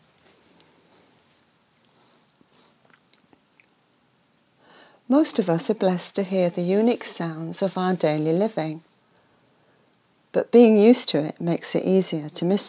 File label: soundscape